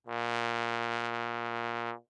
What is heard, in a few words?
Bass Trombone ff Bb2; MIS Pitches - 2014; Brass